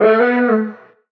vocal storm, Bm; SD AURORA Sample Pack; Slate

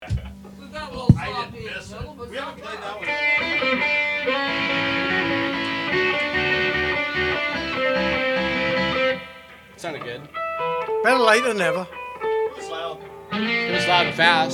Vocals and Background

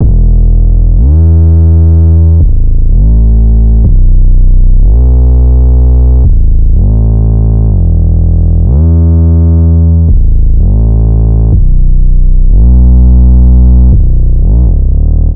Synth loop 125 Em, Synth Bass Loops; Slate Digital BASSHOLE Sample Pack

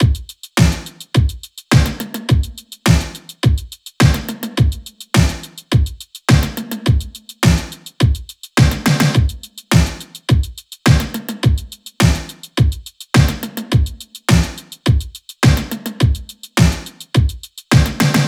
drums 105; Drum Loops; Full Drums; Testarossa; Slate; MIDNIGHT Sample Pack

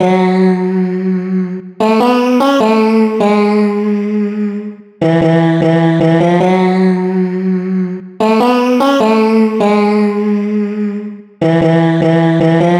vocal chop, 150, F#m; Lava; Slate Digital Omnivox Vocal Sample Pack; Chops

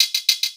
perc rattler, Drum One Shots; SD MIDNIGHT Sample Pack; Slate

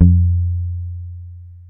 Bass Muted Long F, Electric Bass One Shots; Slate Digital BASSHOLE Sample Pack

